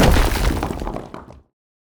Stone Wall Break

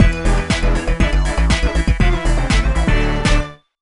Sting End Of Level; monsters vs aliens; nds; Ginormica

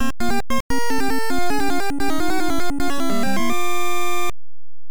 Game Start; king s knight 1986 nes

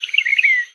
wren

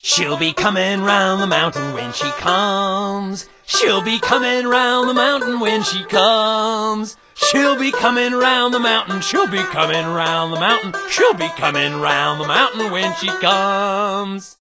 Banjo; out from boneville

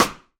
Sidestick; A Dance of Fire and Ice

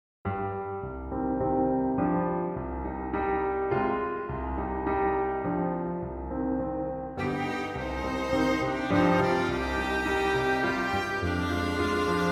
Trio for Piano Violin and Viola; Last Dream

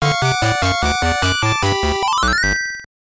Jingle; qbert for game boy 1992 gb

